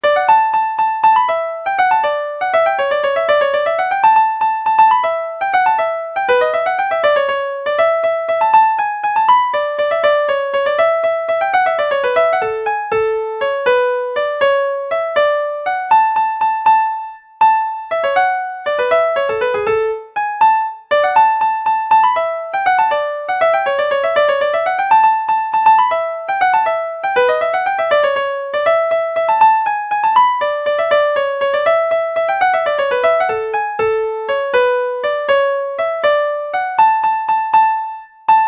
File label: flute solo; in vauxhalls symphony